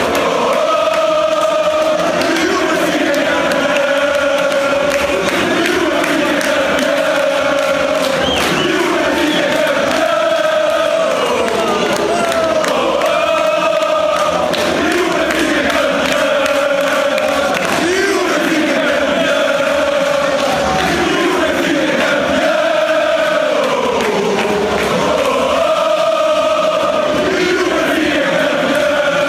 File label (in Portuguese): e campeao, fan chants; e o benfica e campeao